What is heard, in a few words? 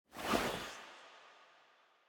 Clothes; smite; izanami